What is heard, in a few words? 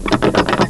LATCH